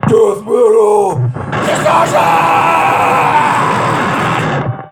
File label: Death Metal Distortion